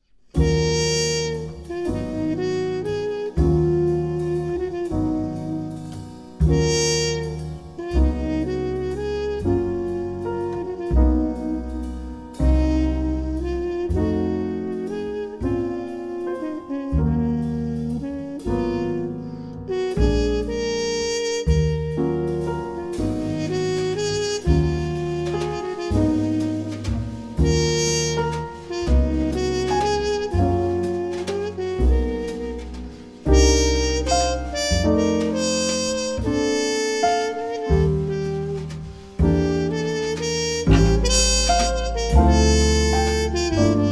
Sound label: waltz